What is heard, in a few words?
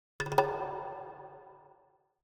Jungle Drums Fear Fast Thin Hits; Hollywood Edge; sound designer's toolkit v2